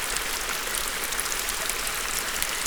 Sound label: stream trickle cave